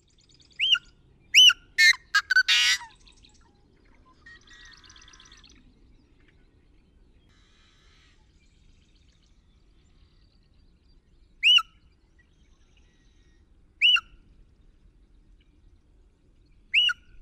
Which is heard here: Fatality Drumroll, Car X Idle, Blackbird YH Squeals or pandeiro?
Blackbird YH Squeals